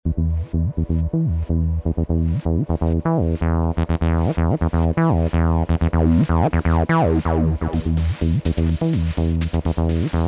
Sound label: Acid Bass Rack; Ableton Live; CFA-Sound WARP